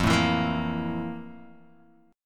F Major 11th Guitar DADGAD

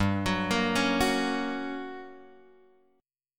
G Minor Major 7th Flat 5th G; GmM7b5 Chord {3 X 4 3 2 2} arpeggiated

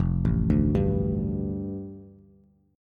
F 6th Add 9th F down Bass, chord; F6add9 arpeggiated

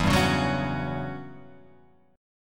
E Minor 6th Add 9th guitar chord, Open F; Em6add9 strummed; JGuitar

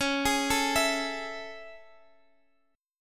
A Major 7th C sharp

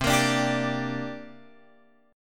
C 7th Suspended 2nd, Guitar, Open F; C7sus2 strummed

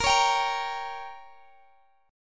Bb Minor 6th, Guitar, Open D

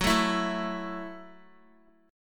F sharp Minor 7th F sharp, Guitar; F#m7 strummed; x,9,7,9,9,x

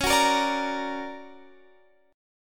D Augmented Major 7th, chord

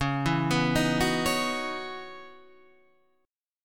Db Minor 11th Db down Guitar Standard; Dbm11 arpeggiated; JGuitar

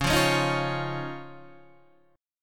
Db Major Add 9th, chord, Guitar DADGAD; strummed; JGuitar

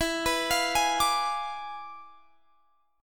E 7th Flat 9th E down Guitar Drop D

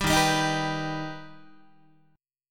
Eb Augmented Eb, Guitar; strummed; 4A,4E,5A,5D,5G#,6C#

